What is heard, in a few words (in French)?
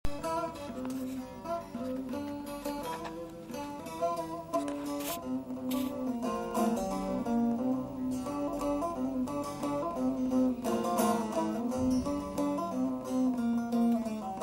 cythare; Iran